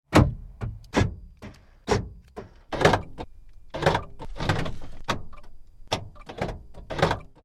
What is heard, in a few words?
Car Central Locking, Car Central Locking Clunks - various car locks and unlocks; Cars; Vehicles; Tom Hutchings